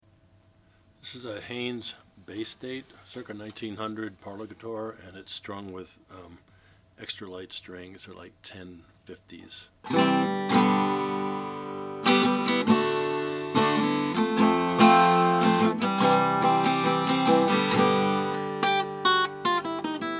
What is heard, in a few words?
haynes bay state natural